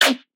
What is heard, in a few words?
synth hit single chug, F; Virtual Riot